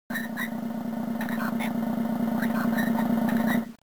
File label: BGM BOMB; WarioWare, Inc.